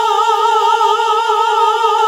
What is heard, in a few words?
GOSP. AAAHH